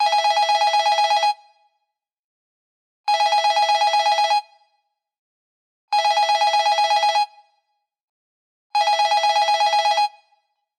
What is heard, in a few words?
phone ringtone